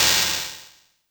Hihat Open FX

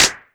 Clap; TRX

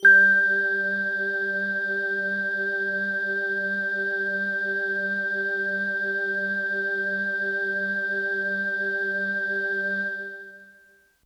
Bell Air Kawaii G-1

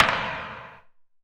Clap Noise Flange

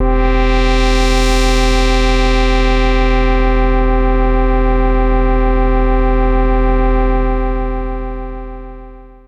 SYNTH

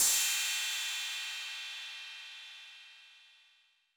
Cym B 808 Sat Decay B, Cymbal, Multi Saturated

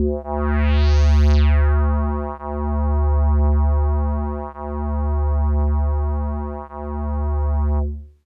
Hologram Kawaii D1; keys

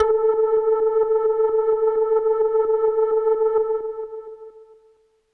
Leslie Kawaii A3, organ

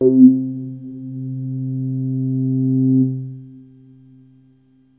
bass C2

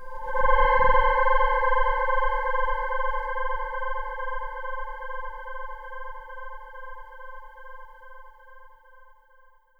FX UFO Swell

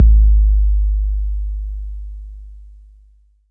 BD 808 Tape Decay

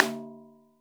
Rim Shot

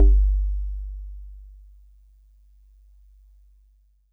Celeste DX100 G-1; Pristine Celeste

